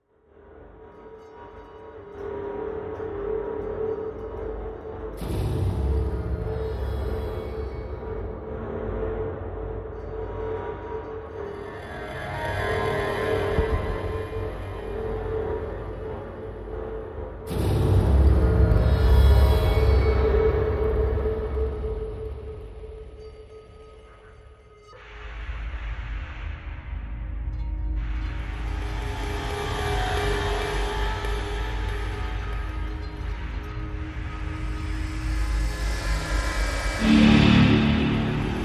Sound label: Pads Only